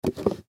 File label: Gift Box Empty Pick Up Cement; Foley; Pick Ups; Objects; Blastwave